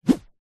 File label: foley stick or thin object swipe swoosh whip through air fast